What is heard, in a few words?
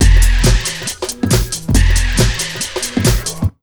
Big Beat Drumloops